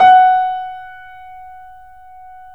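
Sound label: piano, F#, Yamaha; InVision Interactive; The Piano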